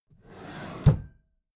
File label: empty drawer smooth open regular